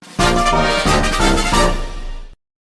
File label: Key Item Get; Pokemon Ultra Sun and Moon